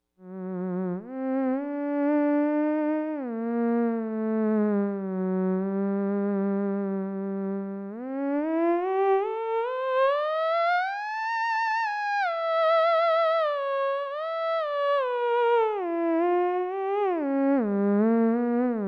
ews resonator, theremin

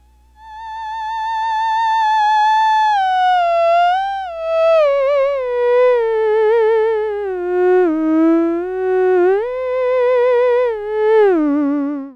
ews enhancer high, theremin; ews buffer exciter

